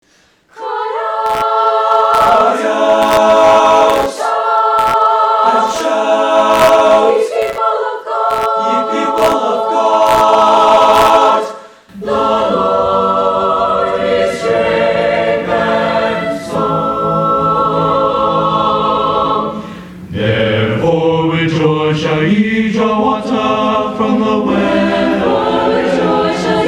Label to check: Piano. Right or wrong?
Wrong